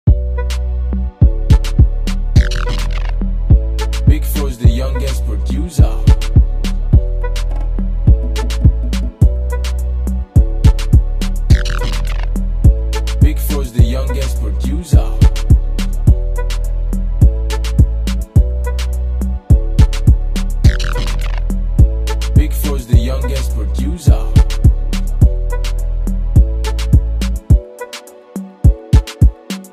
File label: Instrumental Beat